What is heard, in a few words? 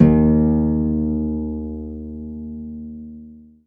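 HARP F2 SUS; ILIO; Synclavier Strings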